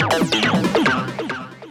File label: TECHNO EXTRA 140